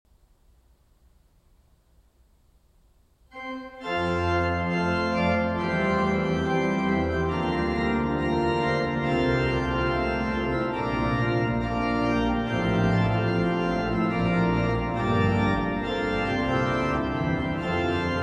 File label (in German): Mottolied Hörbeispiel Orgelsatz; Ökumene; mottolied oekt 2015